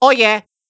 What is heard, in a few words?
haha; luigi; sm64coopdx